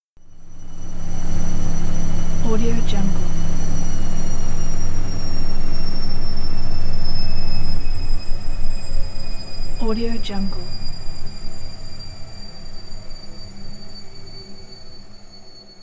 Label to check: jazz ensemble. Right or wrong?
Wrong